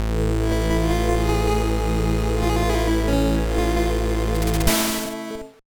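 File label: Jingle, video game; Serious Sam Advance; GBA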